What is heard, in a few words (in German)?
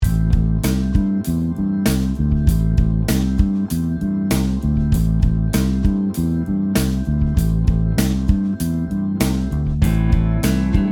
Rock Bass Pentatonik; rockige Bass Riffs